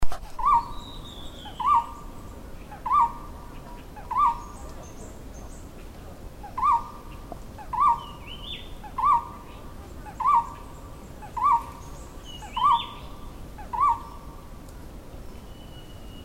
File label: barbet golden throated Megalaima franklinii